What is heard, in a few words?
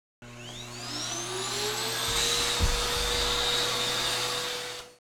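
soujiki, vacuum cleaner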